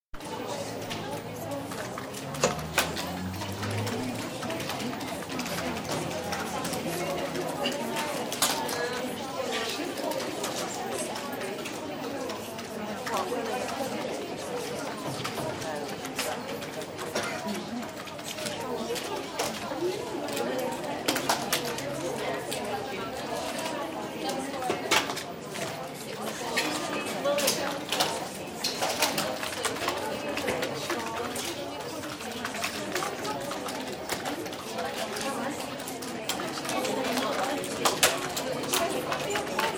BT Telephone operators, Jackfield switchroom with 999 alarm audible occasionally; 1925 onward; BBC